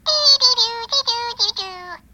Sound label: furby sings